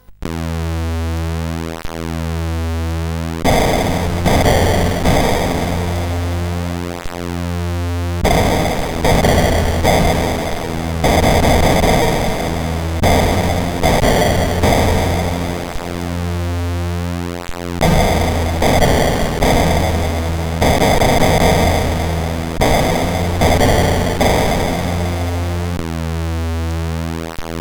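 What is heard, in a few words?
8Bit Dungeon, MOS CSG8580R5; hvsc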